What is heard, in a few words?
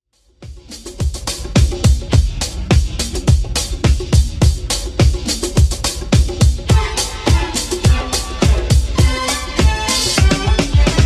70s Extend Redrum 105bpm